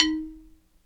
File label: XYLO, Afro Xylophn